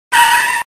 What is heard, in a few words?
screech; agent zeros